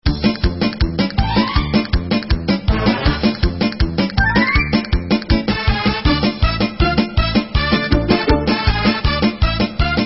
Instrumental; Avi Perez

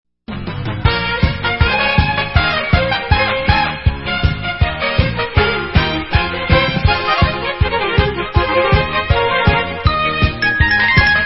Instrumental

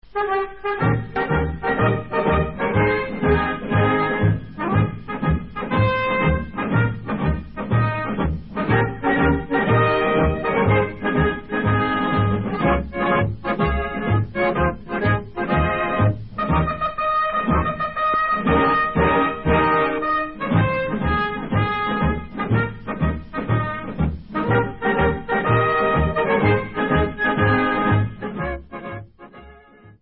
American Instrumental; Mitzad America'I

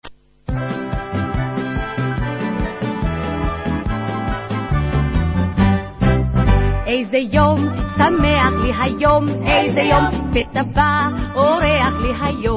Vocal

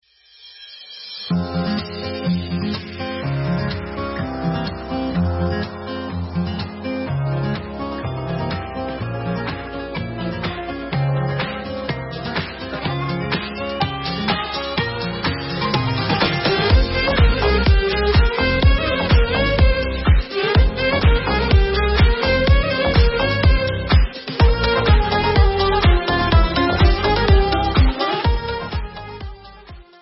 Instrumental; Elad Shtamer

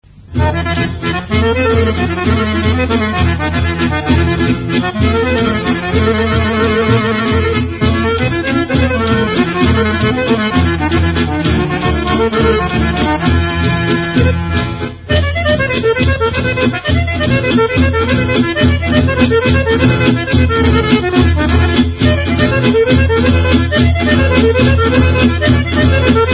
Ratevka, Macedonian

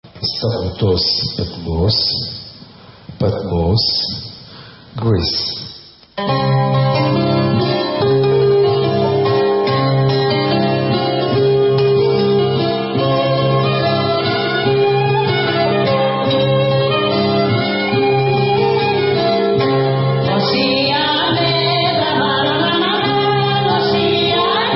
Greek

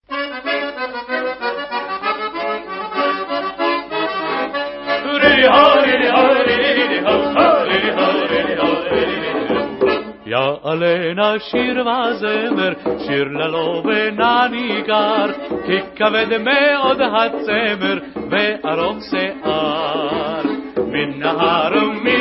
Vocal

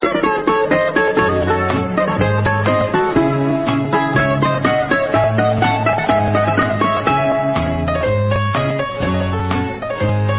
Greek; Biatikus Hasapiko

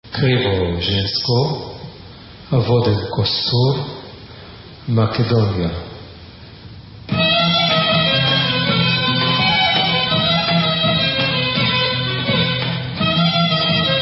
Krivo Zensko, Macedonian, Instrumental

